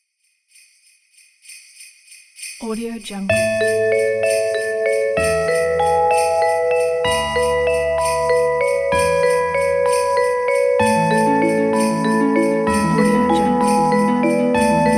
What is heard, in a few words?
christmas magic bells background